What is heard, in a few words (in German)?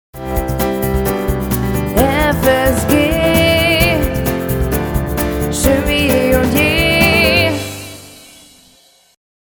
Jingle Projekt; steinhundert